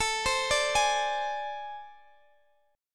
A Minor Major 7th Double Flat 5th, down, Guitar, Open D